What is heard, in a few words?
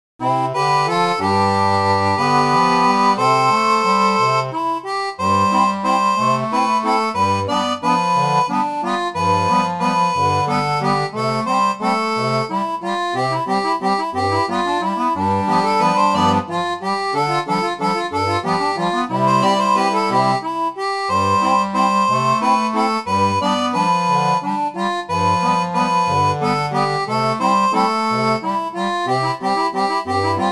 seraphone